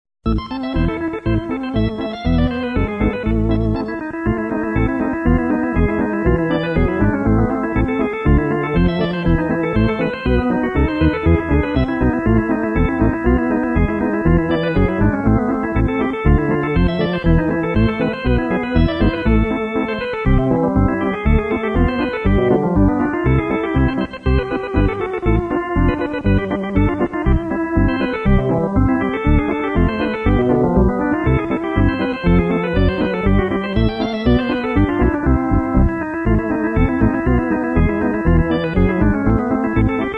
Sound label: hammond